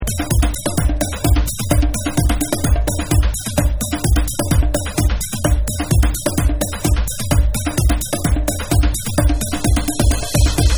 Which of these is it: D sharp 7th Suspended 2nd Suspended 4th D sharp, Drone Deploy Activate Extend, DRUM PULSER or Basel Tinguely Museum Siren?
DRUM PULSER